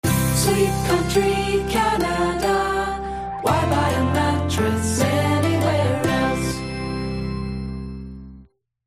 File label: Jingle Full Intro Band; Sleep Country; 30th anniversary